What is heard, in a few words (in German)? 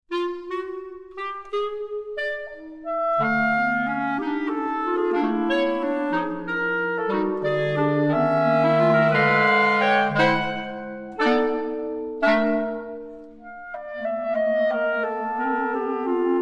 Vier Klarinettenstuecke